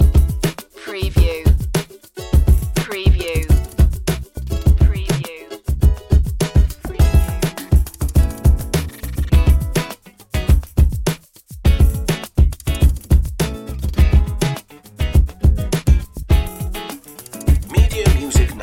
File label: samba hip hop loop